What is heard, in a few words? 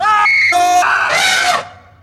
scream; oggy; random lethal